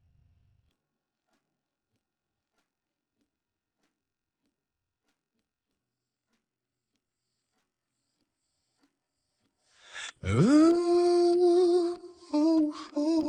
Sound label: vocals